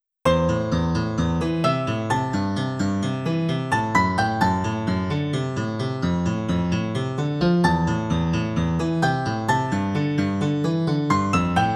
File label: Piano Short